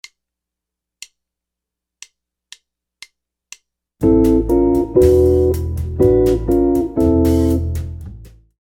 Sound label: Chords, jazz guitar